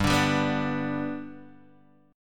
G Major down Guitar Open G

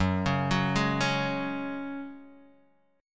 F sharp Suspended 2nd, Guitar Half Step Down

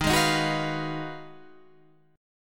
Ab Minor 13th, Eb, guitar chord

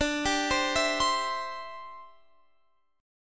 D 7th Suspended 2nd Suspended 4th D; D7sus2sus4; arpeggiated; x,17,17,17,17,20

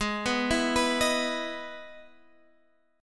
E Major 7th Ab, chord

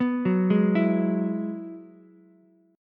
Gb 7th Suspended 2nd Suspended 4th, Ukulele; arpeggiated; JGuitar

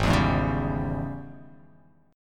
G sharp Major 7th Suspended 4th Sharp 5th

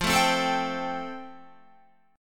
F Major Add 9th, Guitar; strummed

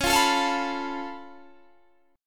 Db Augmented 7th Db down Guitar Standard; strummed; JGuitar

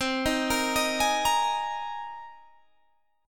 C Minor 7th Sharp 5th, Guitar, Standard; Cm7#5 arpeggiated; JGuitar

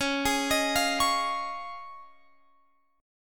Db Major Add 9th, Guitar Open F; arpeggiated

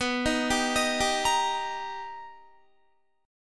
B Augmented Major 7th B Guitar, chord; B+M7; arpeggiated; 18,18,19,20,19,18; JGuitar

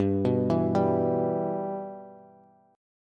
G 6th, Bass; G6 arpeggiated

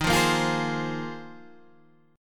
Bb 7th Suspended 2nd Suspended 4th Eb

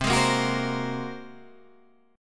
A sharp 7th Suspended 2nd Sharp 5th C

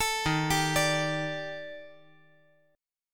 D sharp Major 7th Flat 5th, down, chord; arpeggiated; JGuitar

